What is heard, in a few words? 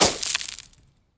wall rocks; Golden Crayon Sounds